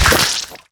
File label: blood, gore; garrysmod; nuke